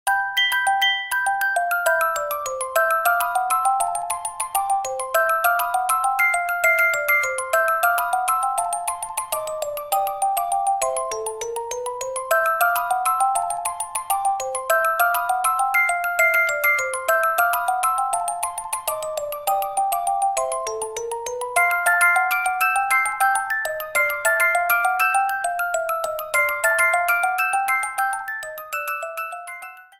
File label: Sky Night; SMM2; SMB